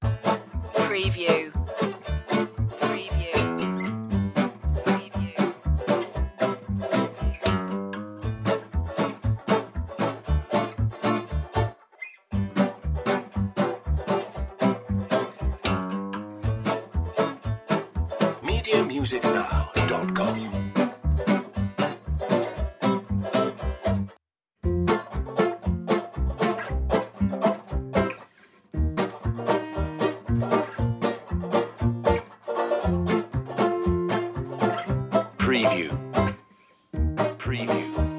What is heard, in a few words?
clown-o-rama loop